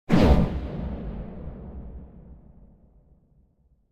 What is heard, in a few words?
Symphonic Hit Bass Strings Scary Hit; Hollywood Edge; sound designer's toolkit v2